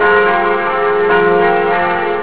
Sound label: carillons; fortsmith